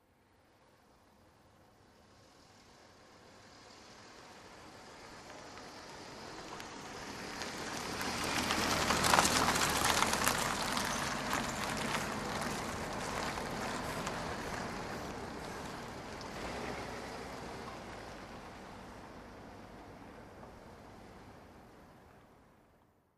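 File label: Car, By Slow, close up On Gravel And Pavement.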